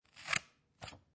Scissors Cut Thick Paper, Cutting paper with pair of scissors